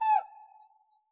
cuica mute, Yamaha DJX Rock Kit

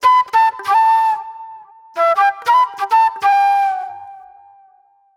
Sharp Delay Flute

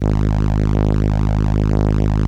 ELKA, String Machines; ELKA RHAP

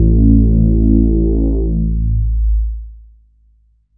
Synths BASS

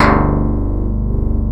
WAVE DIG G1, MICROWVE

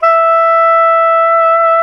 SAX SOP SAX, Soprano Long; Roland LCDP07 Super Sax

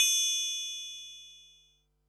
HH, Pearl SC-40 Hihats and cymbals

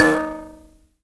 HIBZ KL D#4, HIBZ KALI; Heart of Africa

